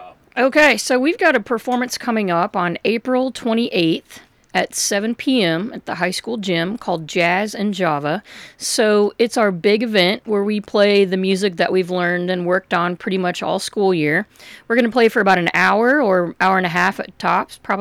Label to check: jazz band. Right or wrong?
Right